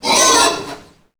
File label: pig chase; A Machine for Pigs